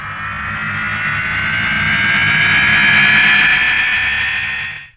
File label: robot vehicle